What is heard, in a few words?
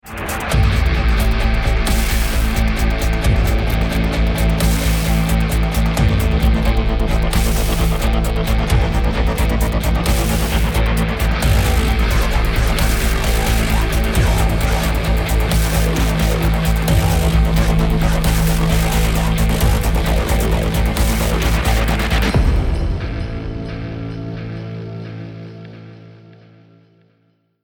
start round, music